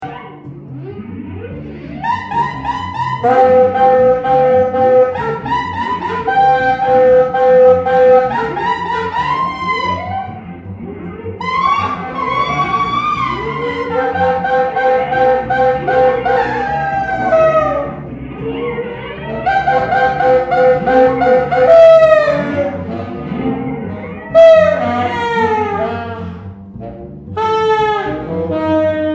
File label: Mostly Sax